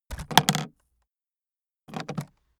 aluminium case open

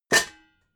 pots hit

